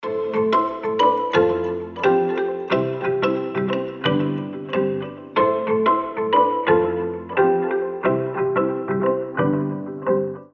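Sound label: tropical xylophone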